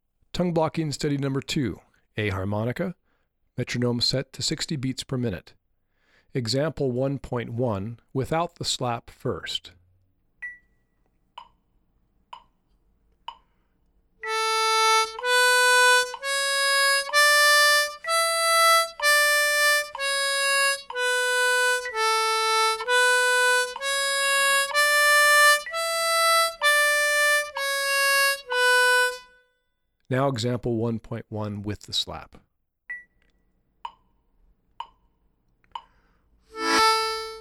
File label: tongue blocking study exercises